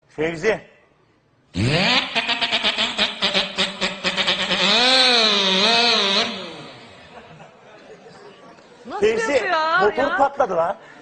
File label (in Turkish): araba ve motor sesi cikaran adam